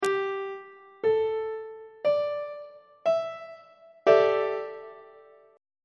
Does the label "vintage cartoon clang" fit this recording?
No